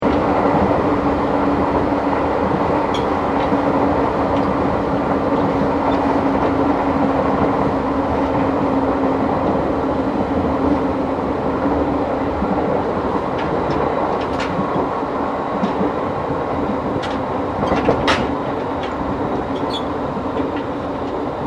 Train JR Regional Aomori Local Connecting Platform Stopping, Japanese Trains; Trains (Sound Effects:Vehicles)